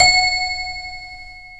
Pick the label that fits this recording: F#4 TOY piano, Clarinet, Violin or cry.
F#4 TOY piano